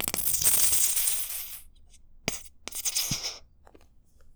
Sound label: Plop Viscous, Bottle Plops